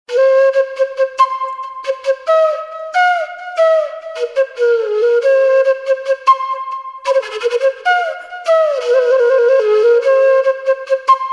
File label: devaraga flute ringtone; krishna